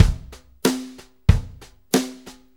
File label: Brushes Loop, Full Kit Loops; Sonic Foundry (Sony Creative Software); Loops & Samples Drum Tools